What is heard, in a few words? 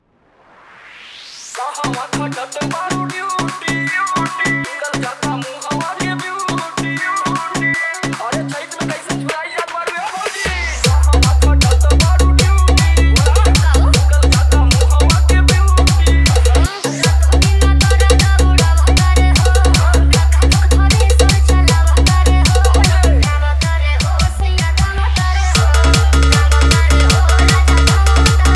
Bhojpuri Dj Remix Songs Edm Trance Mix